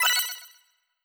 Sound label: button brilliant high